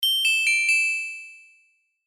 chirichirin

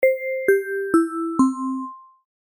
pinponpanpon end

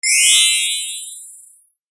chiririririn

